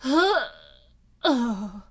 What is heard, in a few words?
dying; peach; sm64coopdx; char select extra chars